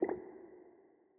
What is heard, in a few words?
zone gas blop